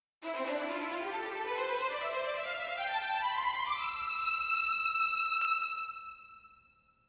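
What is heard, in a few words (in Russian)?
скрипка; chekanka perehodnogo materiala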